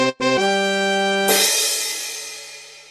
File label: victory; happy wheels